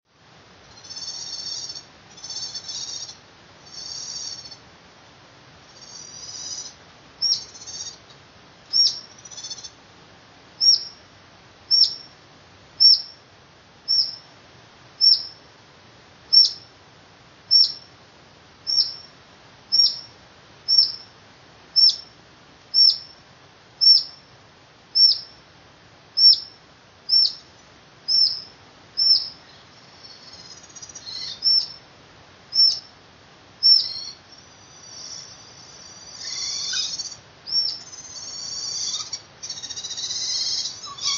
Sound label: Otter Sound